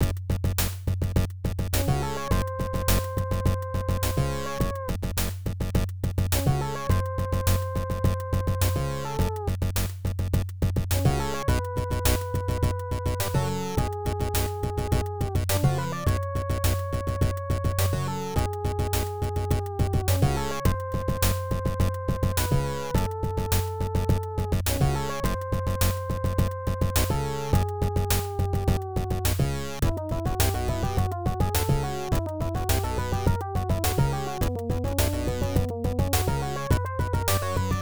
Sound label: Jungle, AdLib; Blake Stone Aliens of Gold; MS-DOS